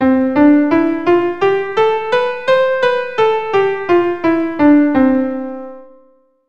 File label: Scale, keyboard